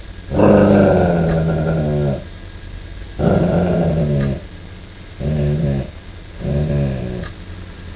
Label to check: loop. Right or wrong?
Wrong